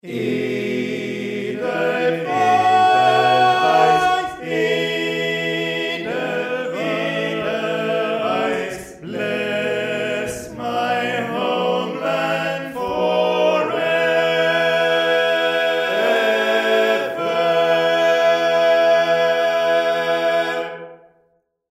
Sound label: Bass, barbershop tags